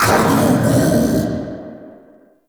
Cast Vocal; Uriel Brimstone; Warframe

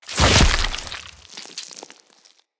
Root Spider Elite Web Shot Proj Burst; Animals; Spiders; Abilities

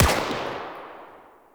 UMP Shot 3D; Warframe; Venus; Venus Flying Spaceman Agent; Corpus

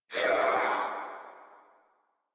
death crewmen; Warframe; Corpus Crewmen; Crewman B

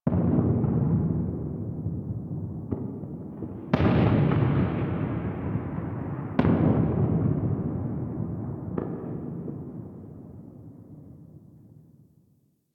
Ambient Distant Explosions; Nineteen Ninety Nine

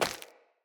Grunt Step Default, footsteps; Enemy; Characters; Corrupted Sproutfolk

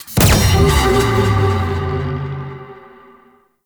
Drone Disable; Warframe; Fortuna; Venus Fortuna Quest

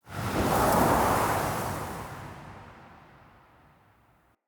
Soul Steal Latent In

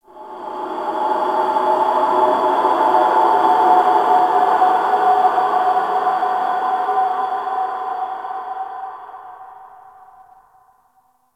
Ice Cave Wind; Warframe; Corpus Ice Planet

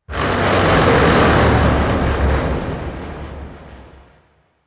Destruction Texture; Warframe; Corpus; Railjack

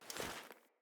Foley Walk Default, Default Clothing; Player

